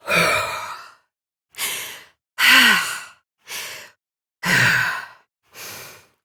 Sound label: Breath Heavy Slow, Adult; New War; Adult Operator